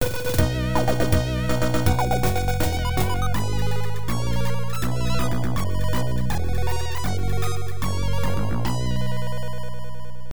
Cutscene Jingle; dragon half; pc 9801

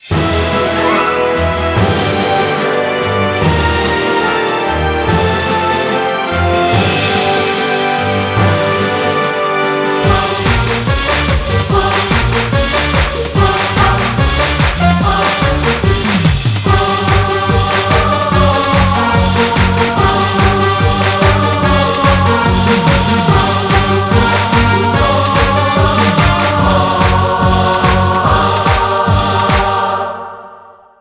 Music Win; Megamind: The Blue Defender; NDS

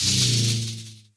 Iron cobra Recoil; Puzzle Chronicles; PS3; PSP; X360

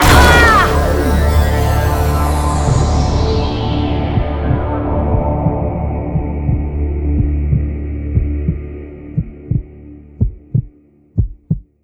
Death Vox

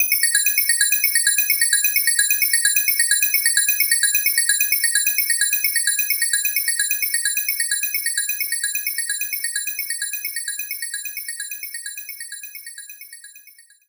All Clear; Bubble Bobble also featuring Rainbow Islands; Saturn

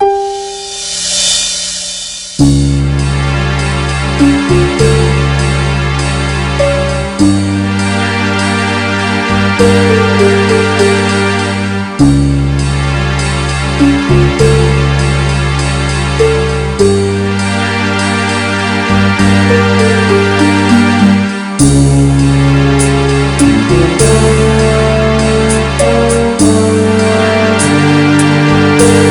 crypts; Varrock

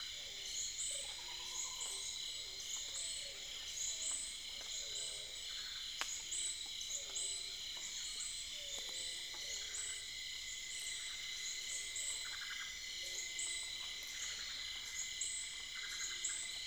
amb rainforest

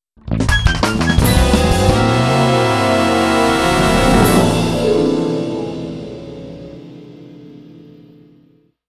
Mission Failed!; The Simpsons Hit & Run; PS2; Plowing Through

